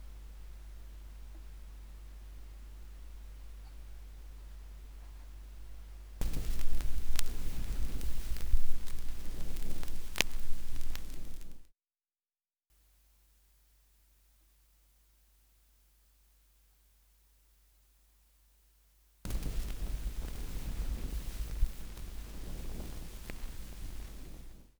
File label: noise needle down